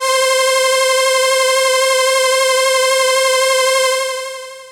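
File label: c2, synth, Ensemble, FAIRLIGHT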